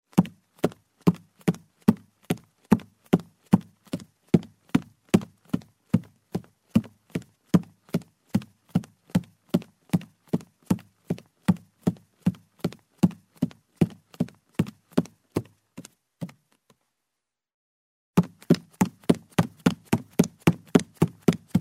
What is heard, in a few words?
Running barefoot on a wooden floor; steps